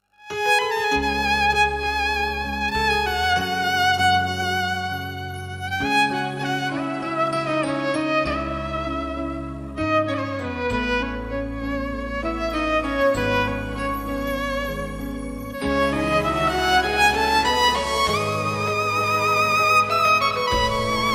violin; Veer Zaara

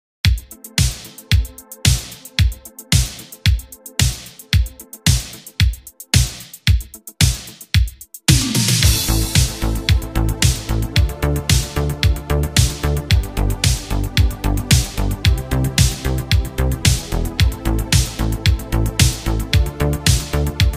new italo disco style instr cover

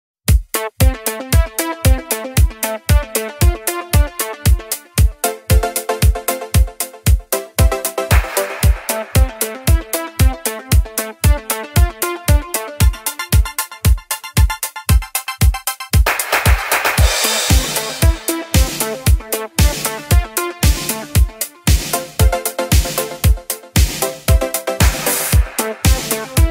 italo disco music; extended version

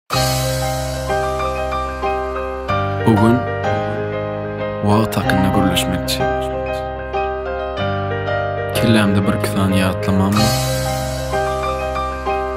turkmen rap

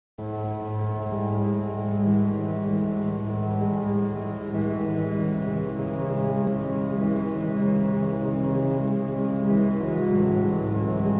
ultra slowed and reverb

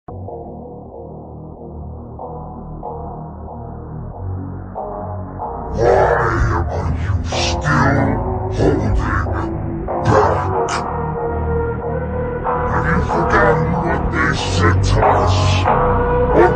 phonk

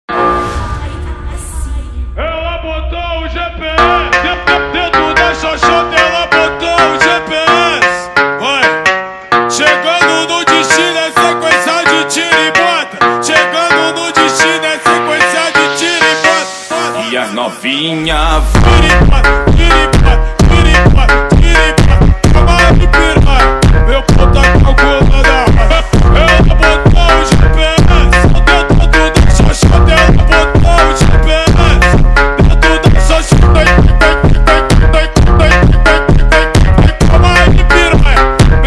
Brazilian funk aggressive phonk music

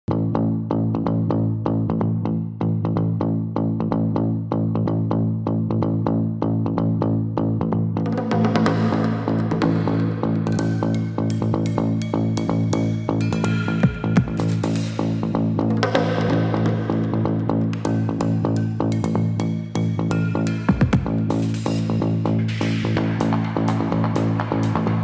techno meets garbage drummer

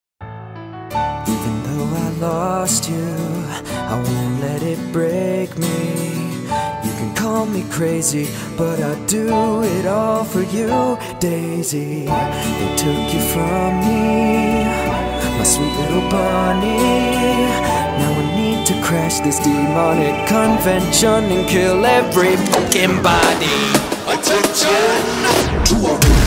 rap; DOOM Eternal

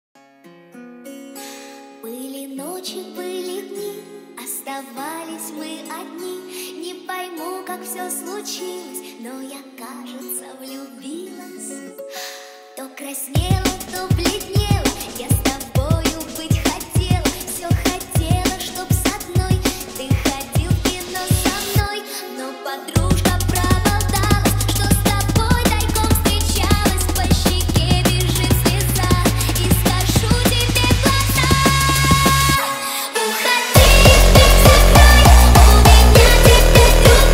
hardstyle remix

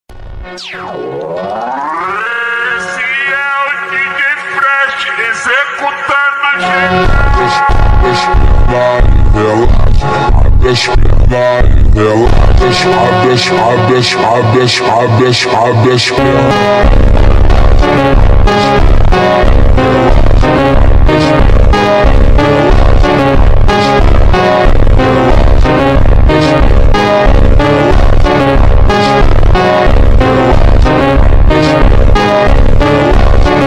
slowed reverb tiktok version

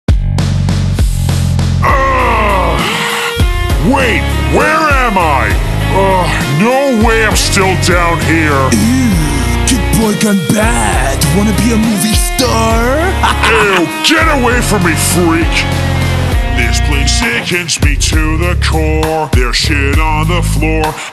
slowed reverbed

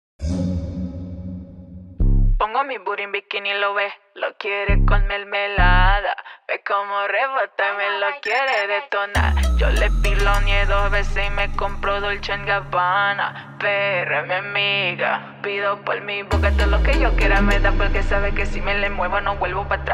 baile funk

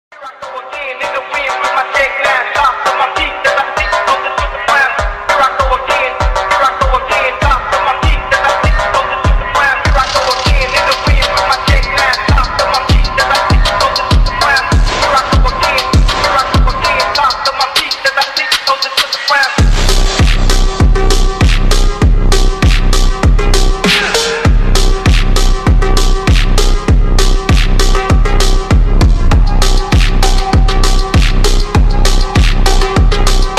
slowed reverb

